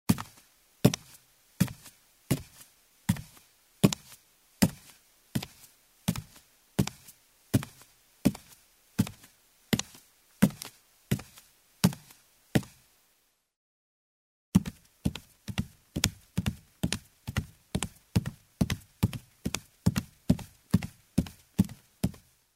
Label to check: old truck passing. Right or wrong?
Wrong